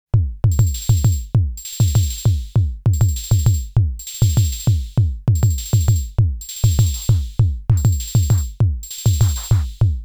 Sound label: Synth ADS 7 Pattern